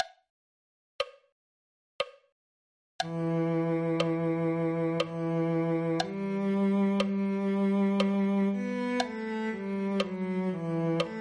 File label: cello